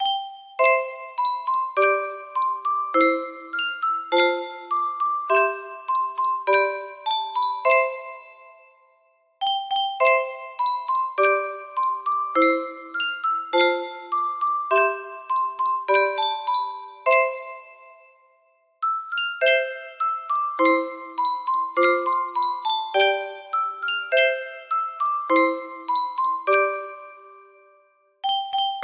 london bridge is falling down, music box; music box maniacs

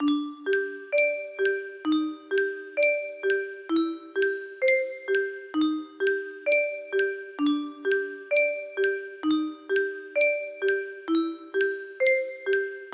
silent hill music box; music box maniacs

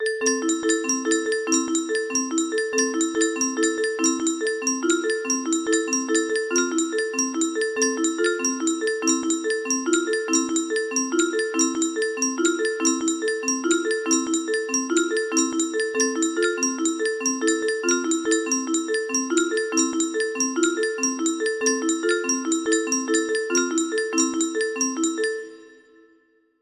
china gates part 02, music box; music box maniacs

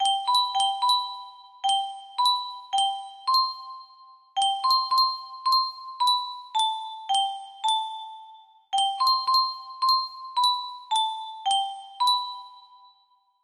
elefante si tu quieres, music box; music box maniacs

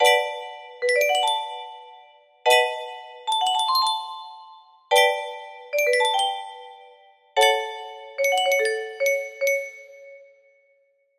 skify bells, music box; music box maniacs

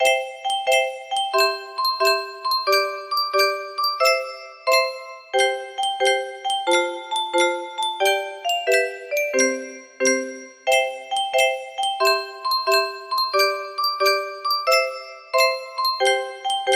jack and jill, music box; music box maniacs

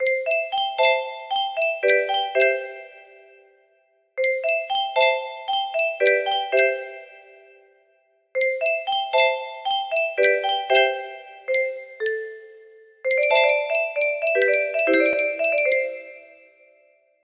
ooh, music box; music box maniacs

